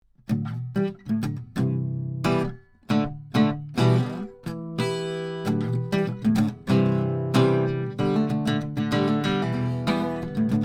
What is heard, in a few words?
Harmonic; U47 Kit ARange